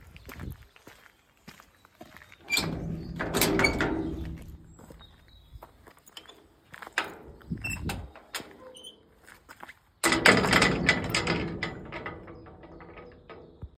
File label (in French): entrée portail arrière, bruits de portails; BRIOUX SUR BOUTONNE (79)